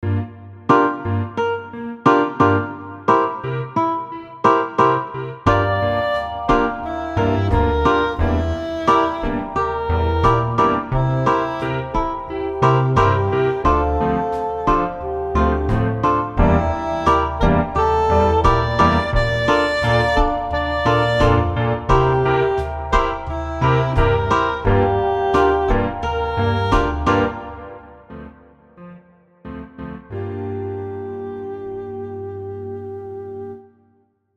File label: boom bap